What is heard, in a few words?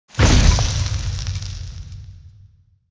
powerup super; Golf Blitz 1.12